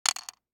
DICE on Wooden Tray, Throw and Roll, Mini, 2 Two Dice; Samples; ArticulatedSounds; DICE Sfx Party (Extended)